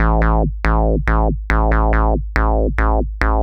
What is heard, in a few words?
bass, dance; Basses